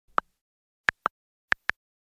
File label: Smartphone texting style short